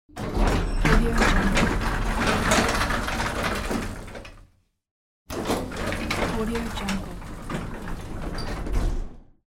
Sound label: old elevator door open close